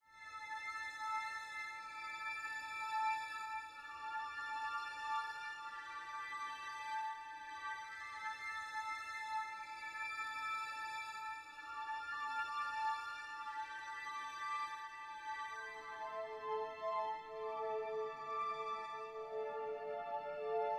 As Quiet As Possible

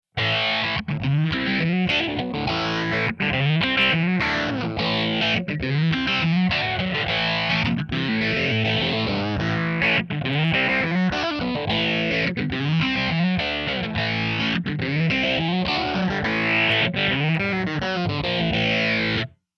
Hard Rock Scoopy

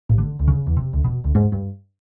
upright Bass

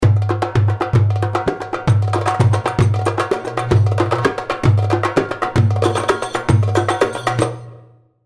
Havasi 114, darbuka

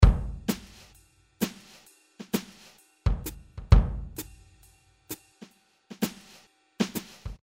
jazz

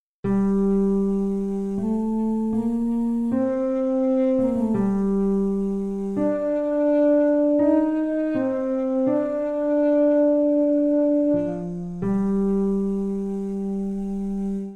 dream daduk

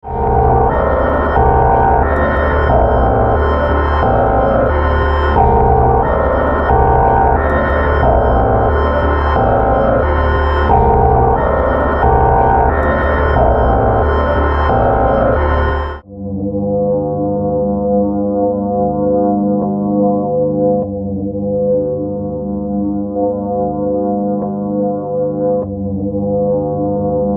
Rhodes Drones

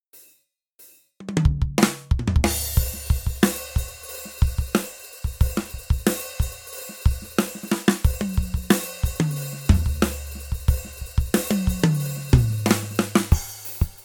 studio drums

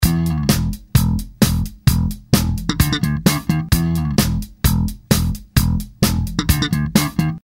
straight slap groove

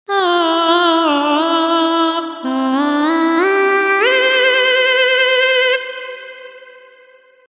Solo Vocal

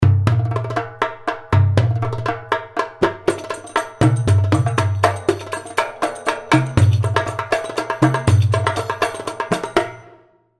Zorofat 120, darbuka

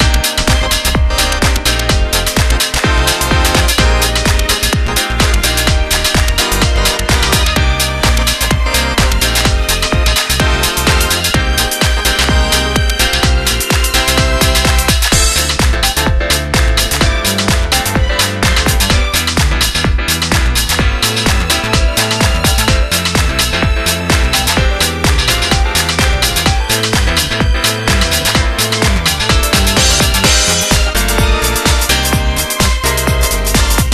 gpo house

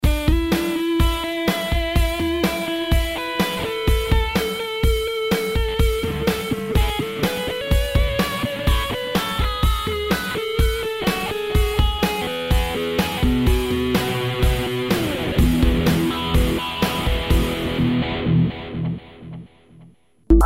random filtering

